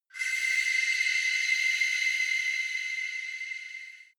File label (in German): shot universal glance, sweeps und swells; comedy-cartoon; soundpool